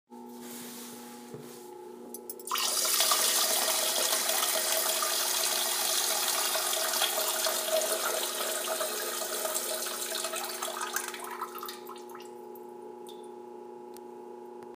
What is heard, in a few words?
Peeing